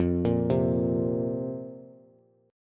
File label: F Suspended 4th F down Bass; arpeggiated; JGuitar